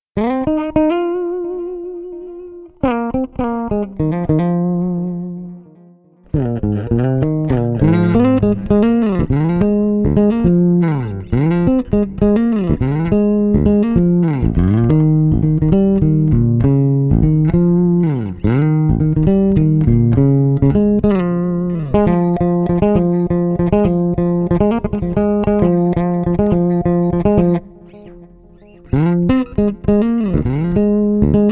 Leads Only, bass

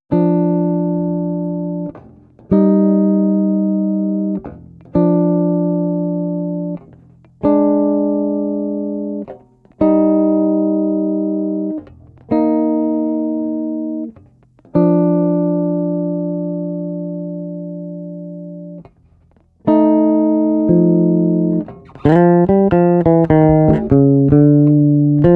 CHORDS

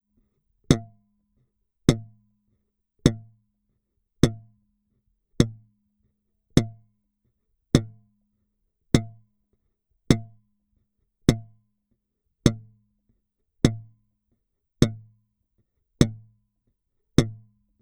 snare

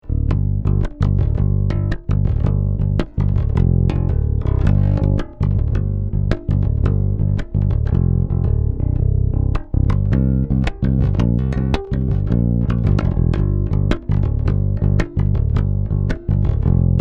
BASS

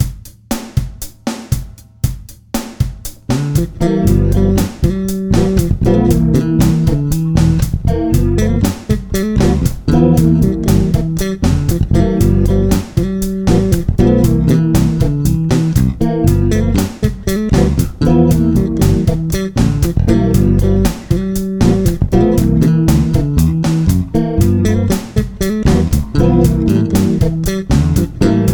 FUNK ALL W LEAD; suhr classic j oly white

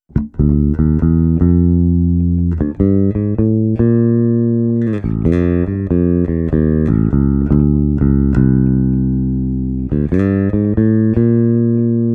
MELODY

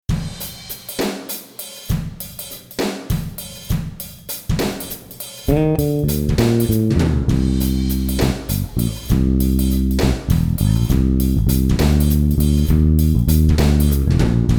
BASS ONLY

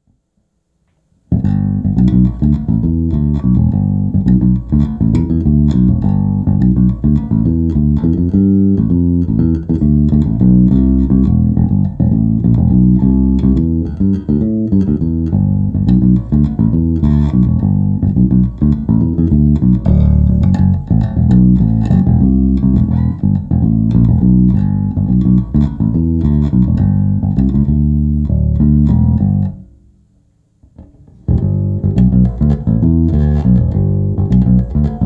FINGER, bass; olympus

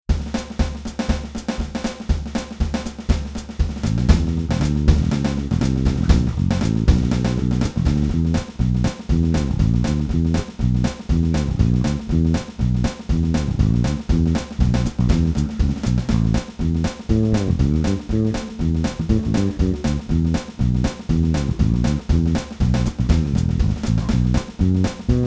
BASS DRUMS; roscoe fretless; pickup mod; mojo

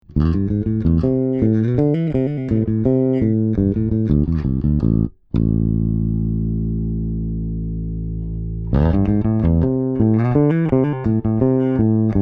passive bass; g l l 2500

